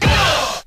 "huh"

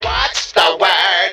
what's the word SUNG

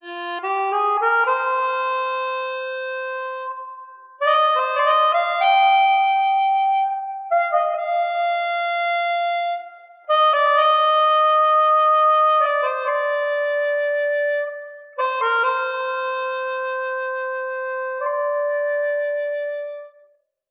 sax, Sad; loops

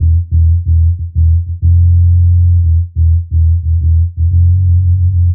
bass angry at nothing; Loops; Phonky

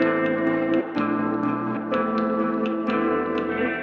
guitar, Jazzmatazz Loops

